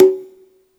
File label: Perc, retro house samples, Drum Hits